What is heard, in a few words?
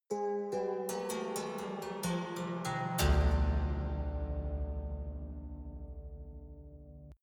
haunted strings chromatic descent